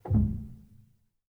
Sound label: rel, Grand Piano; bitKlavier Grand; Piano Bar